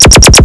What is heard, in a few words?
ZAP ZAP; psion cd vol1